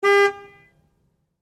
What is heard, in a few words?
Truck GMC Savana Horn Short; 2010 GMC Savana; Trucks; Vehicles